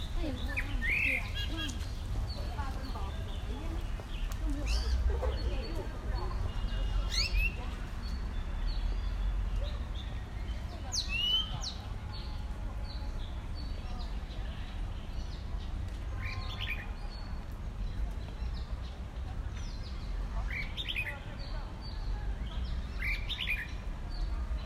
Chinese Blackbird

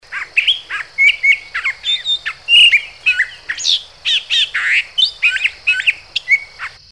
Long billed Thrasher

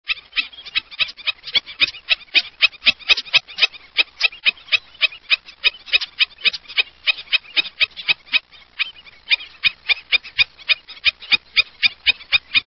Black necked Stilt

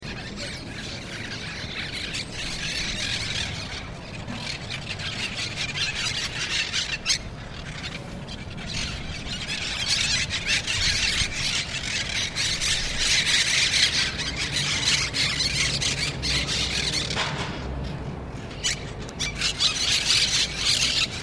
Monk Parakeet, songs

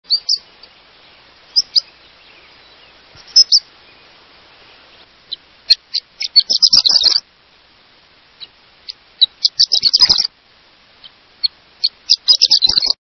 Horned Lark, songs

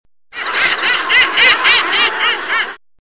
Common Murre, songs

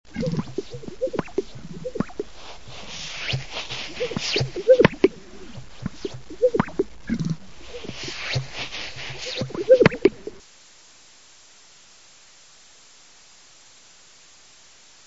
Greater Sage Grouse

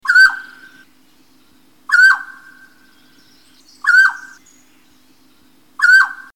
Mountain Quail

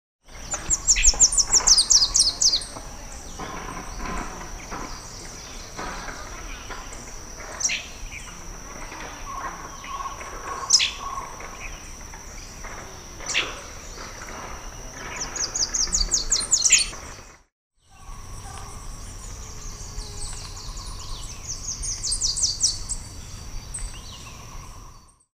Olive capped Warbler

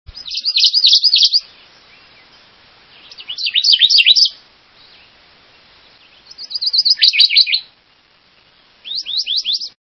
American Redstart

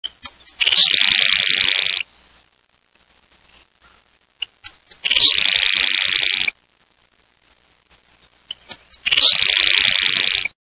Grasshopper Sparrow, songs

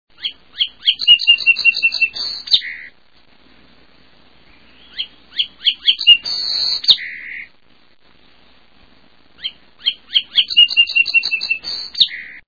Song Sparrow

